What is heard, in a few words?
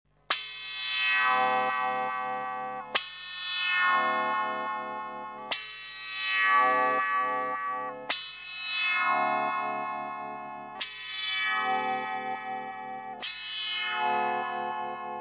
meatball; psst